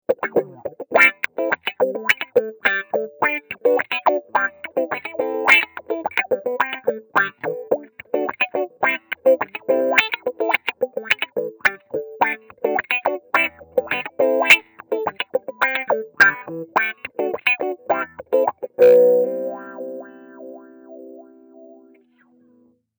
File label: Vox Wah